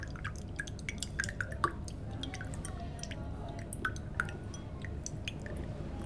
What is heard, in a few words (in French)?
L eau qui goutte depuis le hublot